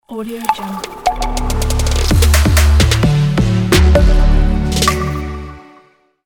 trendy and stylish logo

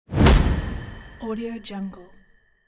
flash

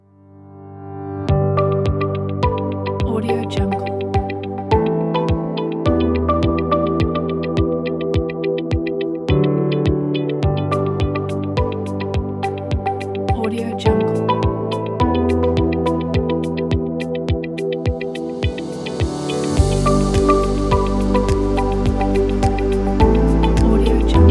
the ambient corporate

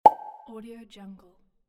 pop; welltone

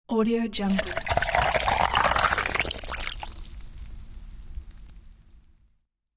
pouring water

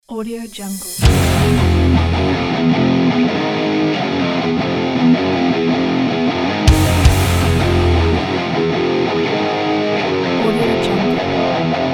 action sport rock metal ident

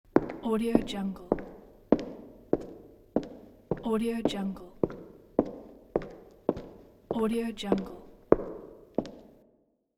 footsteps

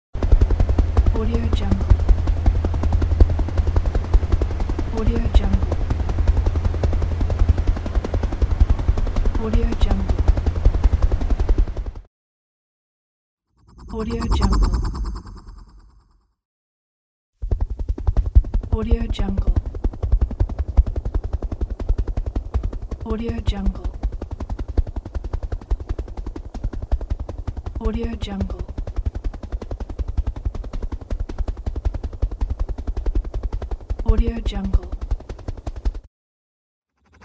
helicopter

